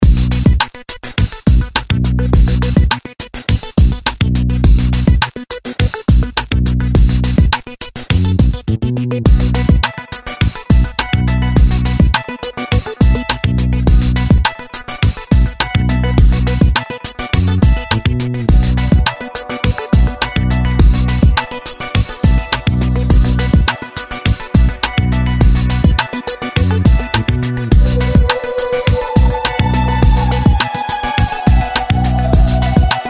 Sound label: cyber; maoudamashii